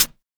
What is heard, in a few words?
smooth hihat A key